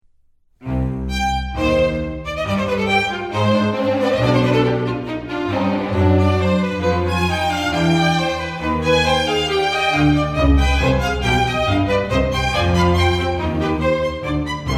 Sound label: Canon 2 a 2 Violini in Unisono; Bach; Musical Offering, BWV 1079